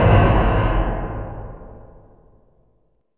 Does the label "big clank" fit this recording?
Yes